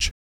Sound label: VOC PERC, BASS PERC